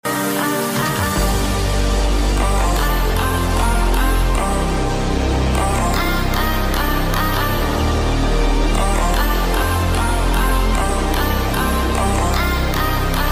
cool ringtone

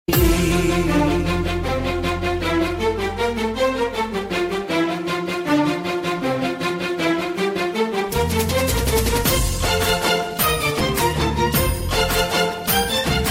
gospel ringtone

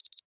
alarm ringtone; sms ringtones

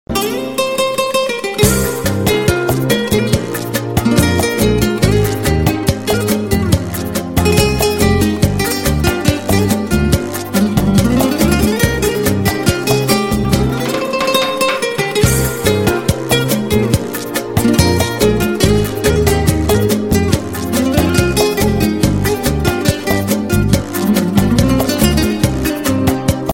spanish guitar ringtone

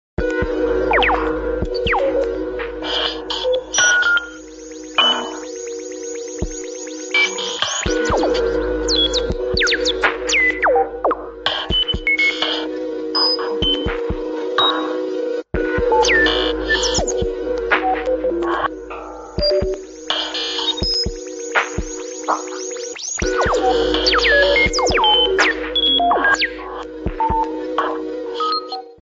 iphone4 station ringtone

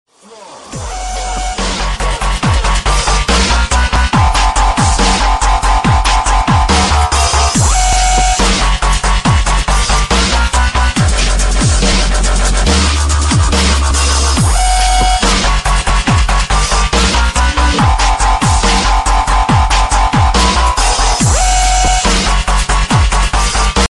dubstep bass drop ringtone

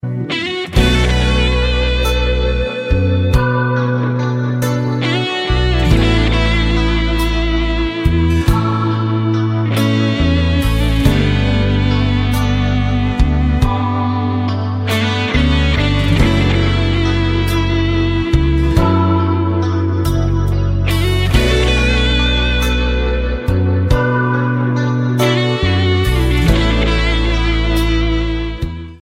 sad guitar ringtone

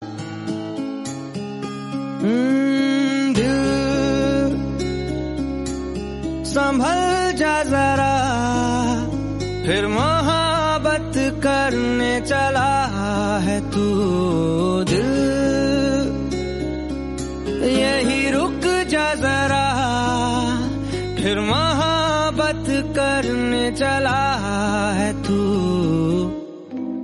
bollywood song tone ringtone

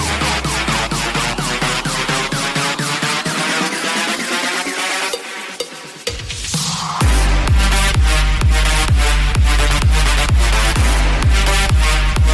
dance house ringtone

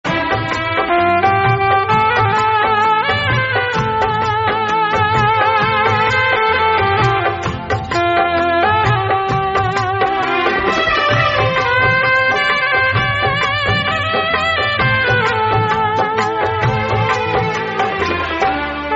instrumental ringtone, bollywood ringtones

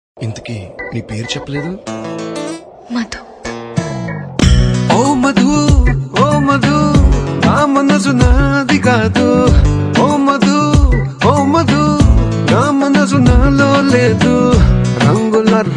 telugu ringtone

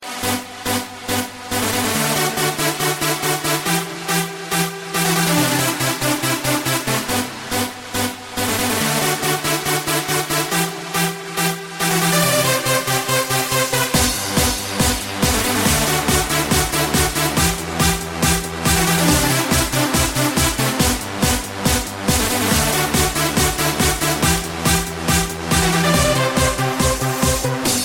lovely house ringtone

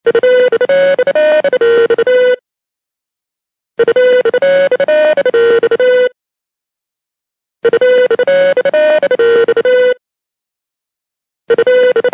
classical background music

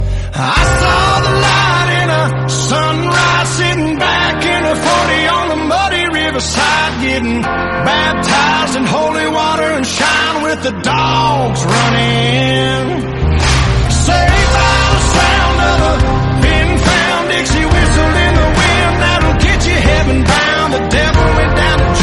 english song ringtone

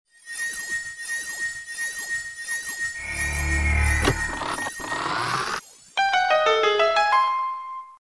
small chest secret

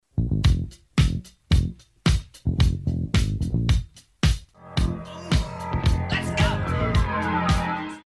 break dance tone ringtone

